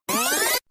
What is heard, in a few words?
Rewind